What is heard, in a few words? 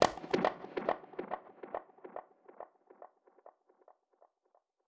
Wet Percussion (Trance)